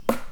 Baton Sec seul mais sympa; Percu Bois

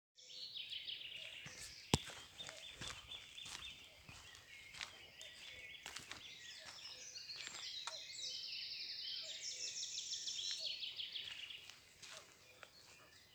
foret pic vert coucou

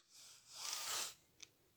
Bruitages renifflement

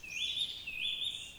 2x Cuiki, Oiseaux

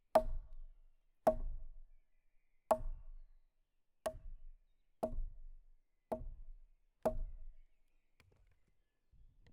Baton sur buchette qui sonne bien, Percu Bois

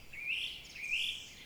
2x Trilles Montantes, Oiseaux

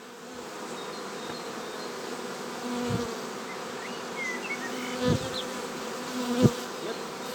Vol d'abeilles passages; Vols Bourdn abeilles

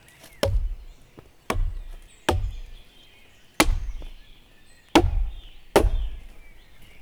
Gourdin sur buches diverses x6; Percu Bois

